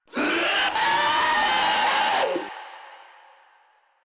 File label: Vocal Attack; Warframe; Proto Infested Runner